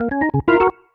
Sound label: ORGN 123, Keys; Houseworx